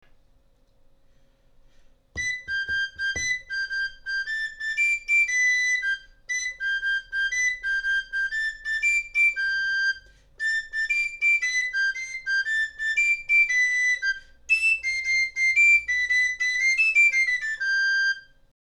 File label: sautarello; Voix Medium